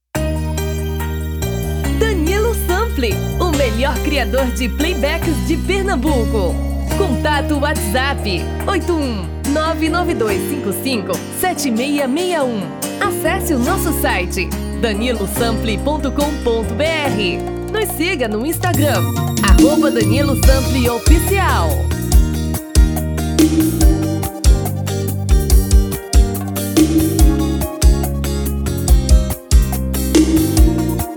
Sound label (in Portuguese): Brega